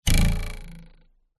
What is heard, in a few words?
cartoon bounce jump ruler twang